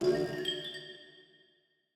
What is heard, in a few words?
ambience room dark temple ritual ready